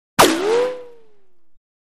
Bullet Ricochet Off H

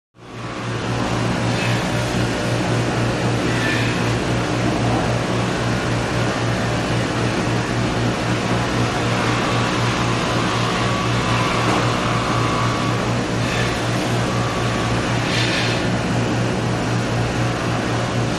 Sawmill Ambience Lar

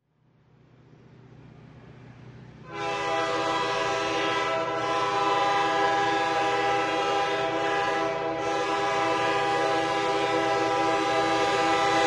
Train Short Horn Blo

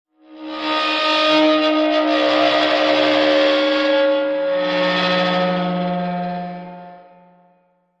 Dry Ice Eerie High, dry ice

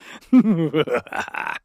laugh evil male

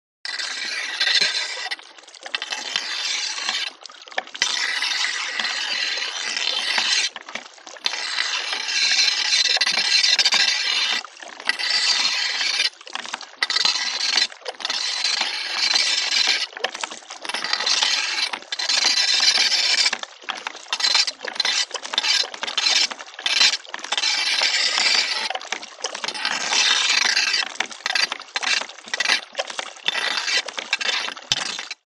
Grindstone Water Noi

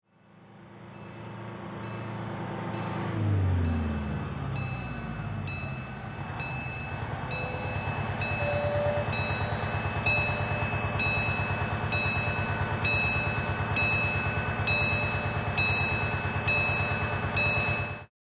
Fire Truck Reverse

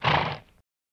Horse Blow Generic

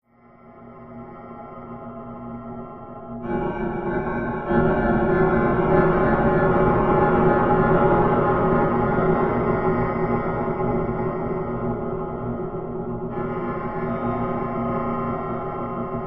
Huge Tubular Bells W, metallic

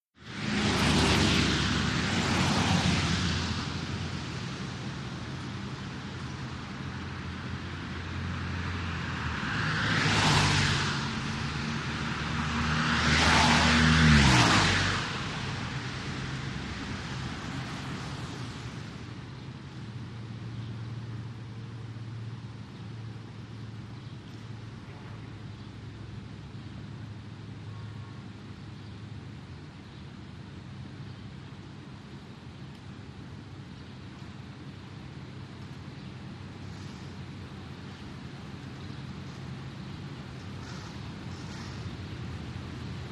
Light Traffic NYC; big city

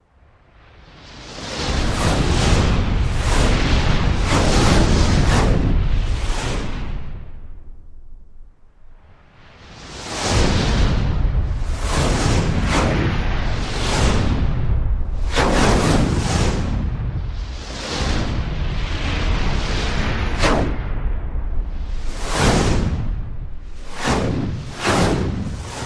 Sub Space Gas Explos, explosions

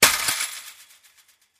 Chain Link Fence Gate; fences

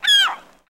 Seagull Cry, Generic

